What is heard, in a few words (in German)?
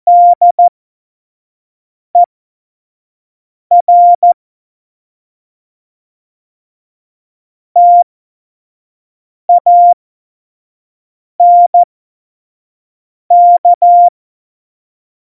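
Witz, cw morsen; Morsekurs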